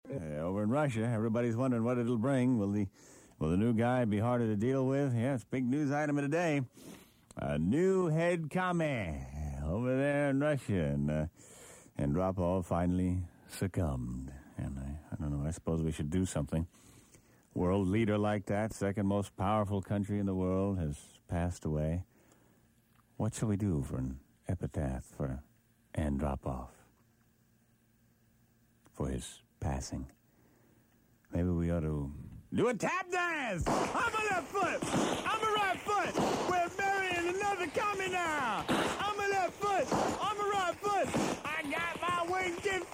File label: Tap Dance; Greaseman; Andropov Died